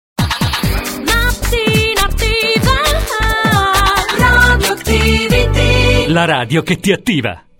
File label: Jingle